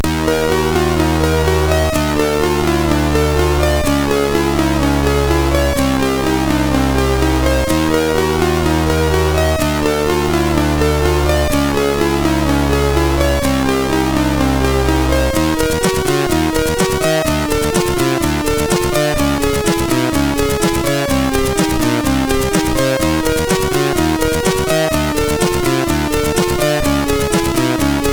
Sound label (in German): Coole Instrumente, MOS6581; HVSC